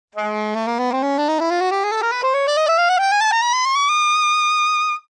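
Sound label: soprano sax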